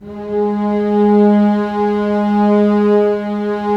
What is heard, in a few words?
STR SEC, Roland LA Composer, Sec Mute St